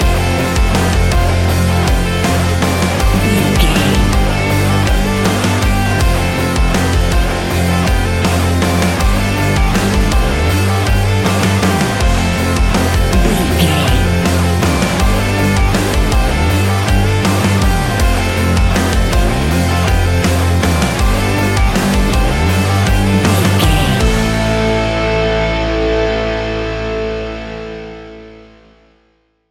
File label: gates metal desolation, A huge and aggressive piece of classic Heavy Metal music. Very heavy distorted guitars, powerful drums and